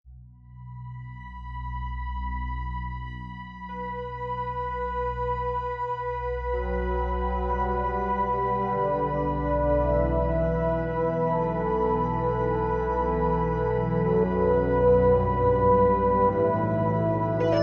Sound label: OBXa